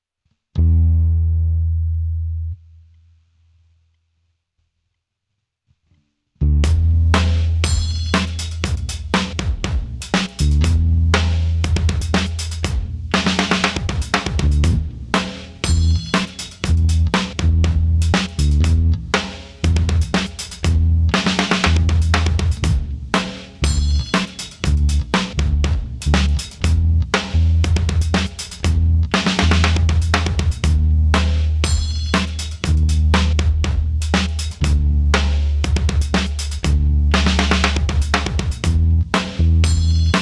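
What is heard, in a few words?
Hurry along bass, Pop & Funk; Natural vibes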